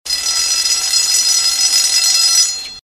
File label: school bell